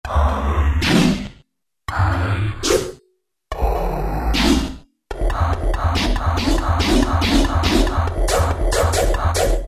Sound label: Yamaha TG33; Factory Presets; SQ-Wrapa